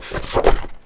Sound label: Book Large Open; SFX Kit; Foley - Misc